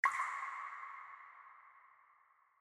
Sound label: drips, injection; Dystopia